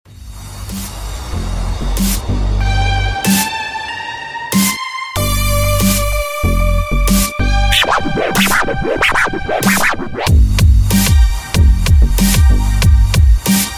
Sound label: Funky